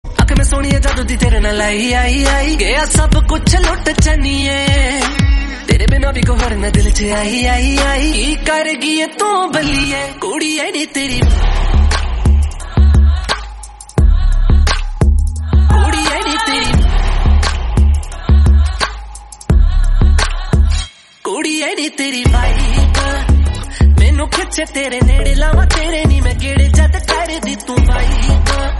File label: punjabi song tone ringtone; bollywood ringtones